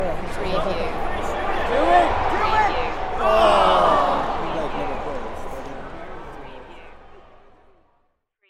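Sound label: Arena Crowd Oh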